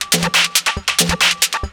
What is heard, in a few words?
drum 138, drum-loops, loop-grinder, Techno